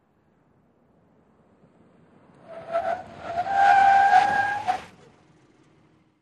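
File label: SUV Truck Accel Skid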